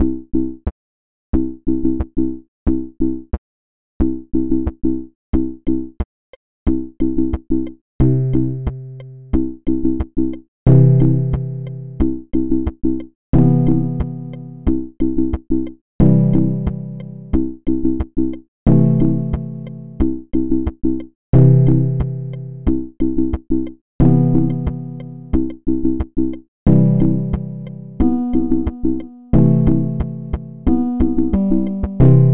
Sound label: make all sounds with only square wave looking chann